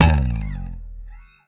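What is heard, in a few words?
slap, bass; Xsample VOL-1; SLAP+THUMB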